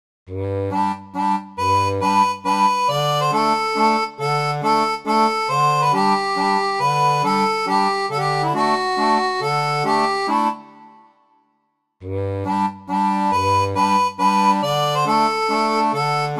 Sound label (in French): seraphone, orgue barbarie